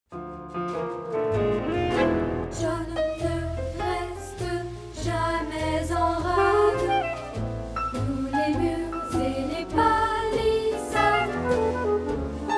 chant

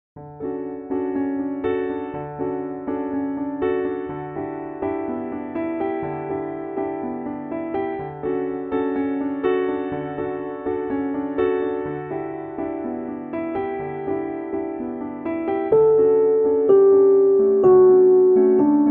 oiseau instrumental et melodie

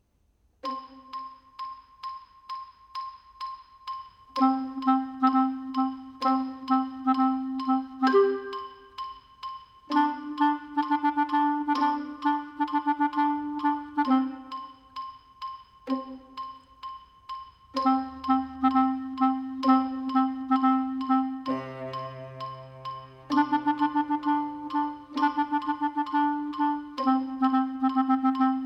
clarinette; petit prince; allumeur

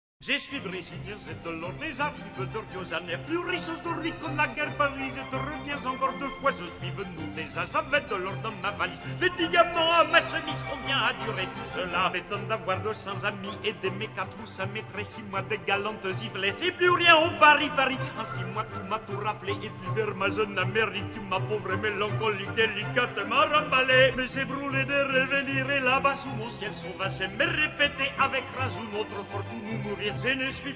couplets bresilien; offenbach; vie parisienne; rondeau du bresilien la vie parisienne acte i

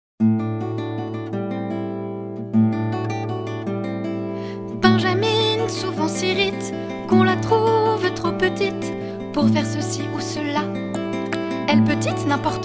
comptine

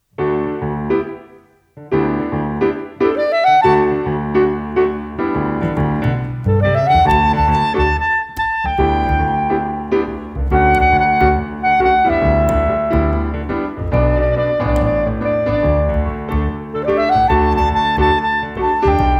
instrumental

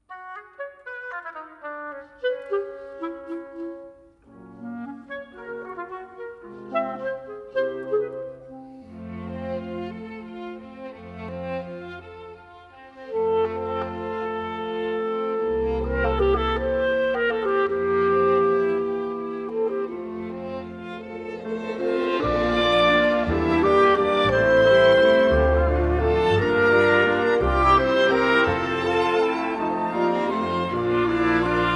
instrumental melodie

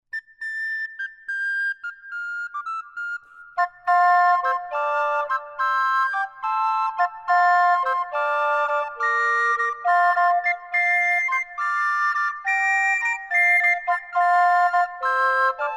ce moys de may instrumental

